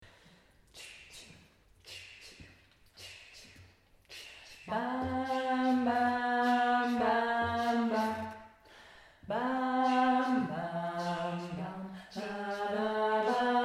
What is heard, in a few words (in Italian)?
piccolo jazz